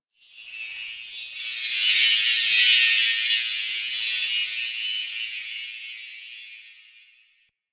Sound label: Energy Chatter; Props; Warframe; Ambience; Sentient Devourer